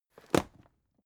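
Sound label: aluminium case small close short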